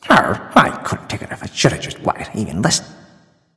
grumble; smite; loki

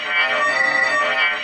magic spear; odin; smite

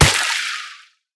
hit; kappa; Smite; god Kuzenbo v2

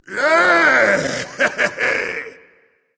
yay berserker; cuchulainn

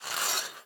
weapon scrape; Smite; Sobek

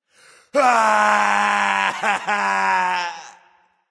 fear; smite; ahpuch

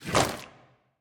kraken slurp; smite; poseidon

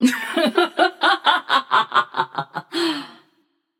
laugh passion; chaac

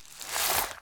wave slide; smite; cthulhu

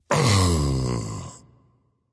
surprise; smite; voicepack; xingtian

